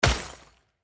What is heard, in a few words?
freeze Block